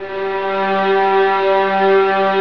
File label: VIOLINS, Strings; Synclavier; ILIO